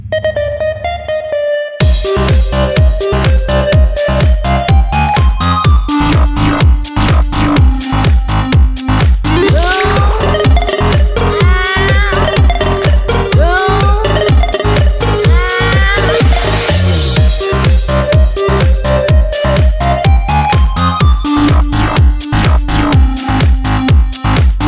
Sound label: electro theme